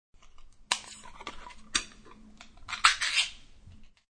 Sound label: Tin can is opened.; Kitchen (Sound Effects:Household)